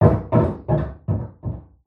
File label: door slam walkaway; scripted sequences